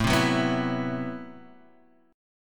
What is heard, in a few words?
A Minor 6th Add 9th A Guitar Half Step Down